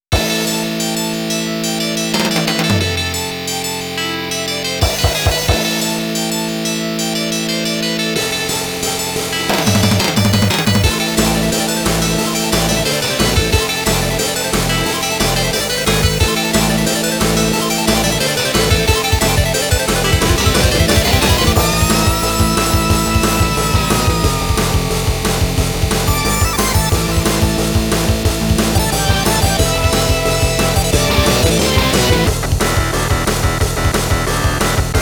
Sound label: ATRACT DEMO BGM; FIRE & ICE; Taito Digital Sound Archives; Arcade Vol.6